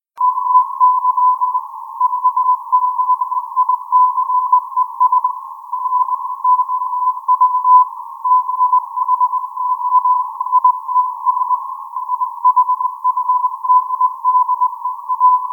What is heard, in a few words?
HAARP 9100 kHz; DL1DXX